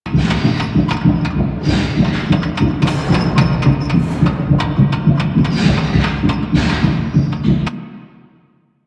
Void Dragon Mechanical Tail Spin Loop; Warframe; Duviri

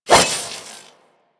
Nikana Swing C; Revenant

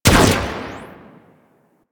Shotgun Shot; Warframe; Venus; Venus Combat Spaceman Agent

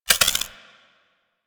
Freeze Ray Mech Chamber; Warframe; Weapons; Corpus

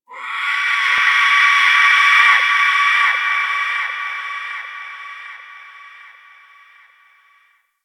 Outpost Creature, Ambience; Warframe; Corpus Outpost

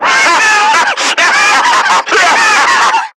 Screamer Self Destruct; Warframe; Lasrian

